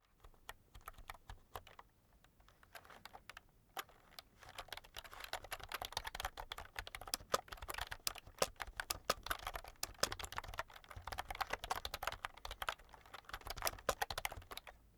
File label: keyboard external type sequence soft; Computer